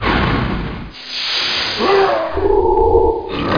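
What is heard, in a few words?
fire bang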